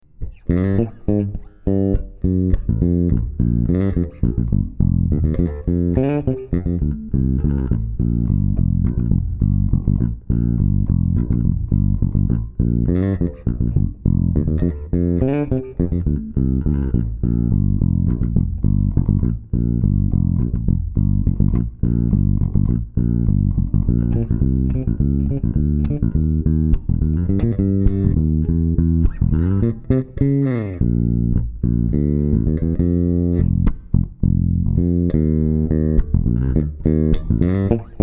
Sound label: bass